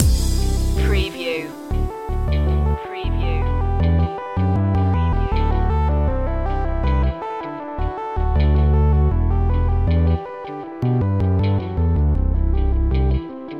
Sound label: progressive dubstep